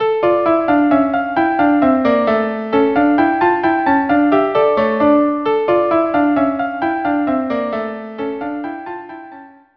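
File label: violin duo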